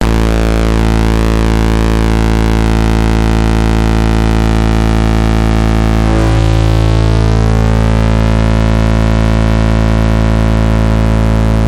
erratic clutch deluxe synthdrive normal

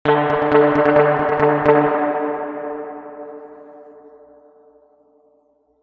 reverb tank 3 spring long staccato

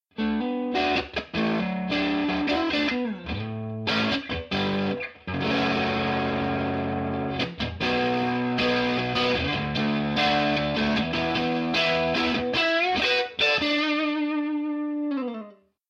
overdriven

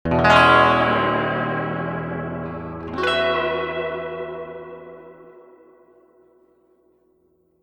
reverb tank 3-spring long tremolo chords

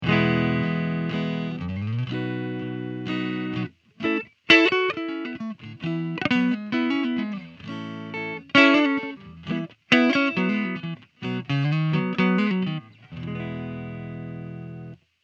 ramrod sound sample twingle little star

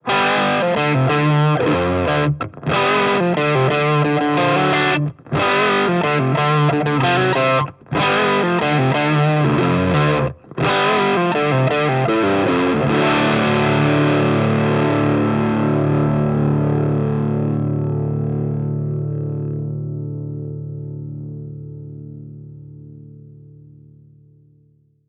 les paul humbucker bridge pickup

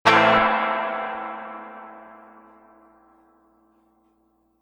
reverb tank 3-spring long quick strum

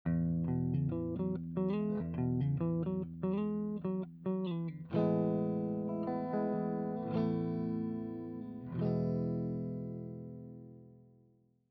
gentle guitar dry

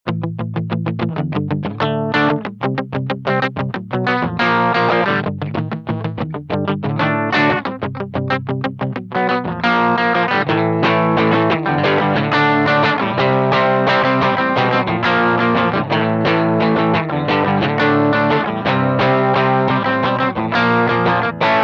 rock riff

